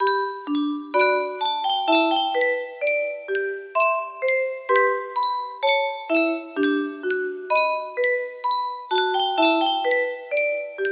yunsheng, music box melody

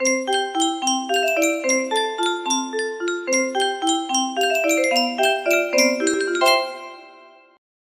alberti base, melody

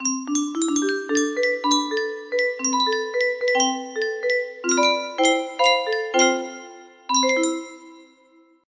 melody, music box

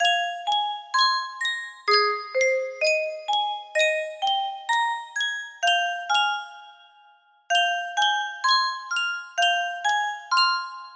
Yunsheng custom tune music box, music box melody

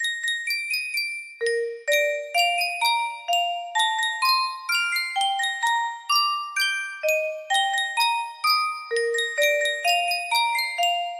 yunsheng music box

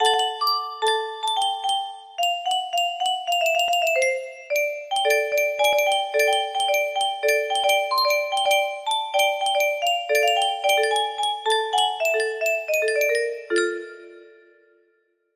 melody

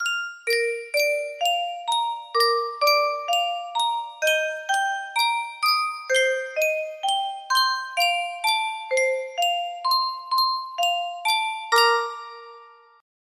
music box

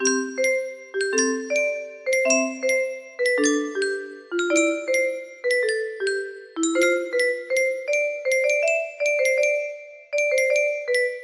music box melody

